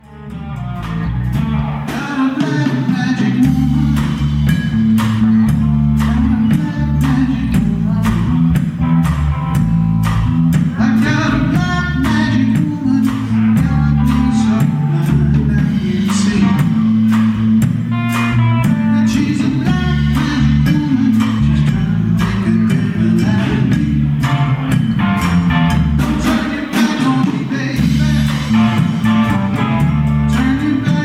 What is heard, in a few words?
Live Song Samples